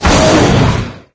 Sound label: ceiling attack solo, wraith; doom3